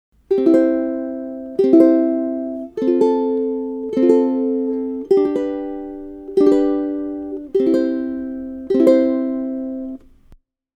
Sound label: Polychords Solo, ukulele